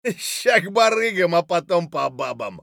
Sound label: scav cheer; eft